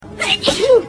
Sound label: Sneeze